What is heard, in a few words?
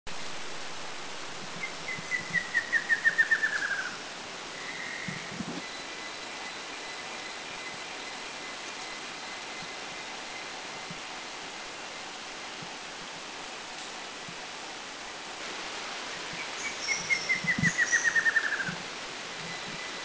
Scarlet-rumped Trogon; Thailand; Krung Ching